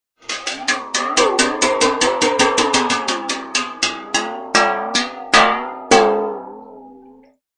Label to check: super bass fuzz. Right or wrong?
Wrong